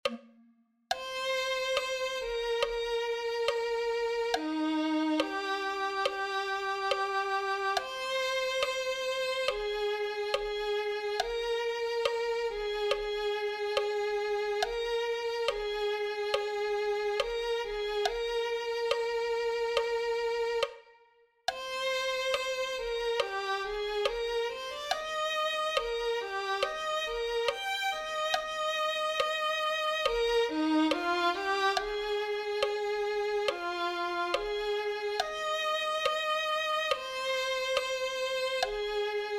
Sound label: violin